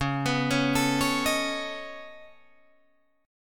Db 7th Suspended 2nd Sharp 5th Db down Guitar Drop D